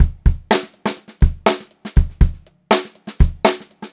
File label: 122 funky beat, funk groove drumloops; USB Soundscan vol.38; AKAI